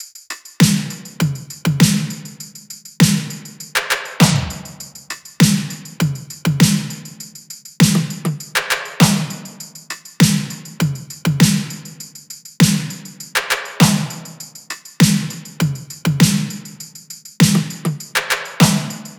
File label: top 100, Drum Loops; SD MIDNIGHT Sample Pack; Slate